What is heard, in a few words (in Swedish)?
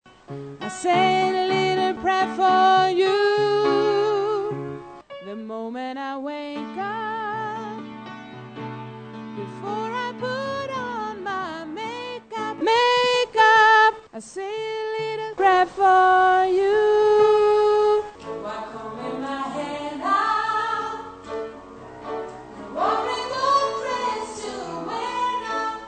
alt, stammor